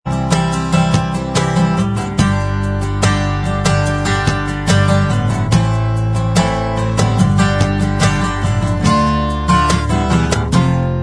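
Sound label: acoustic version instrumental track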